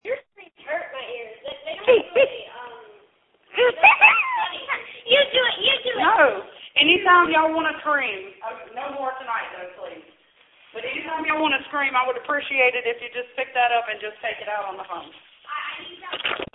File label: Scream